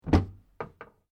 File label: Door pivot wooden closet understairs open; Various doors; Miscellaneous Doors